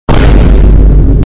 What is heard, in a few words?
vine boom bass boosted